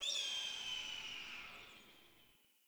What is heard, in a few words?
Exterior Distant Calls Happy Calm; Ambience; Duviri